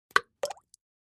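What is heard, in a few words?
Splash Small Plop Plastic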